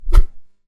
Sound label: item rotate